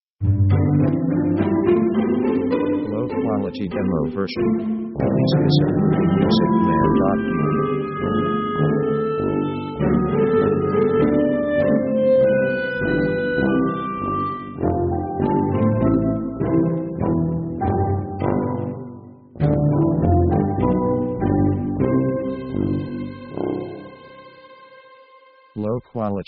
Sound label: NIGHT WALKING